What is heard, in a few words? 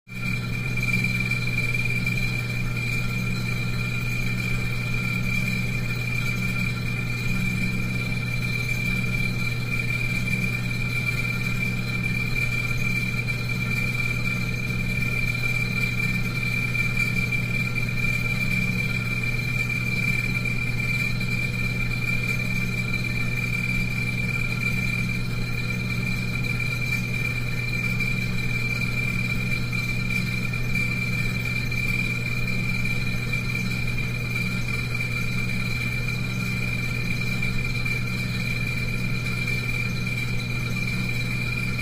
Fan Bathroom Exhaust Old 1; Steady Blade Wobbles; Fans